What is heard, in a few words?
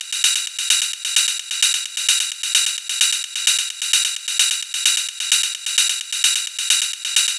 Ride Loop 130, Techno; Techno Sounds 2024; Rides